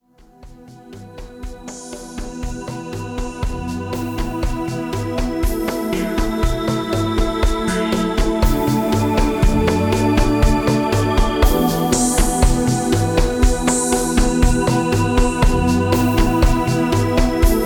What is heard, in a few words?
Spiritual Healing diffrent sounds one voice; Largo; Media Sound Art